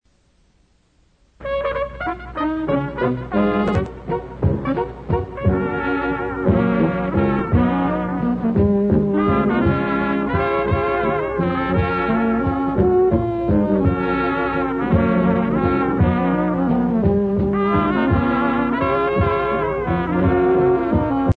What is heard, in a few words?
waltz